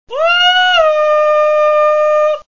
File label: whoehoo